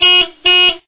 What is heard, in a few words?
Car Horn Sound